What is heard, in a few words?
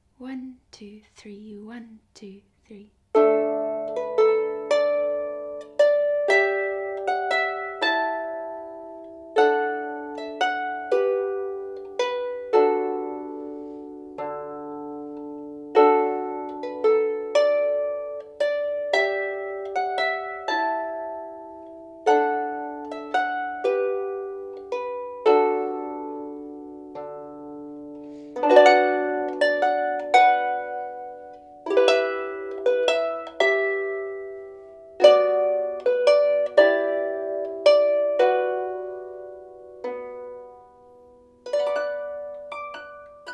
Skye Boat Song, Small Harps; Full Speed Play through